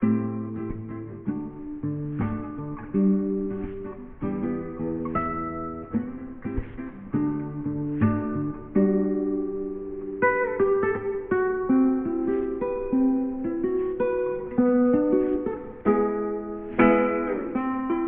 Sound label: relaxing hopefully